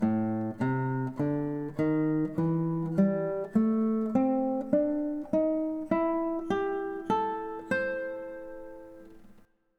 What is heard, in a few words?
Blues Scale In A